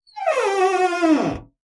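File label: door creak small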